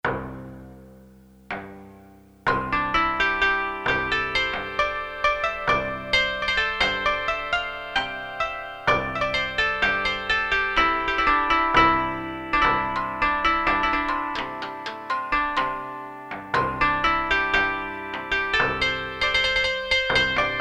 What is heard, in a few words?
Korg DSS-1 Japanese Inst; Japanese Inst 2